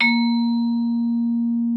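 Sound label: vibraphone A#3; Xsample VOL-4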